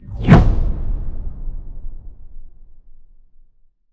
Swell To Impact Flanged Swell; bottlerocket

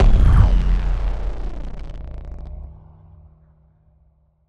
Impact Boom Distorted; bottlerocket

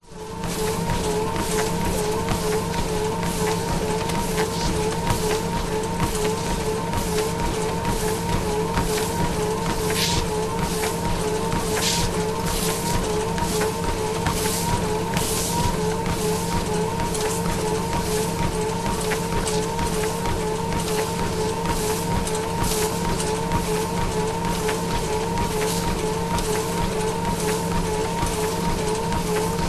sport treadmill walking fast sneakers motor whirr; zapsplat

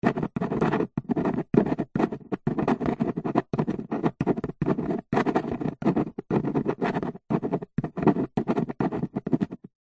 office handwriting pen write on cardboard box

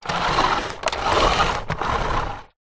leisure rc toy car large plastic push roll carpet

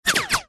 bullets whizz by

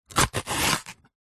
food capsicum pepper chop with knife

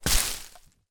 foley tree small kick; zapsplat

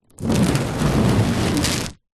office sticky tape peel from wood

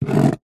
office handwriting pen draw line on cardboard box

